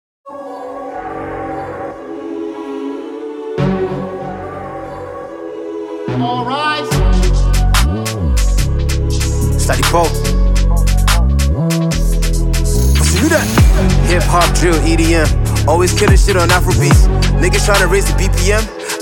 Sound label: rap freestyle